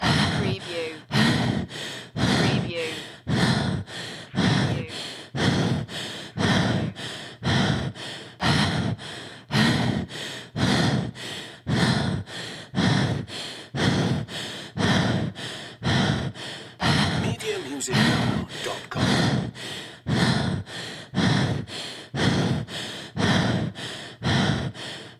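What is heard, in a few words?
Female Heavy Quick Breath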